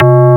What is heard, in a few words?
digi bell C3